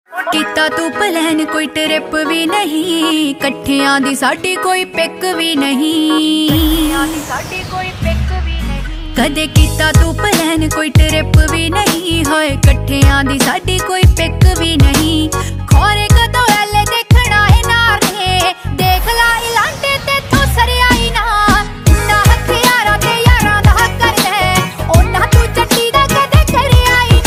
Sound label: Desi Punjabi Ringtone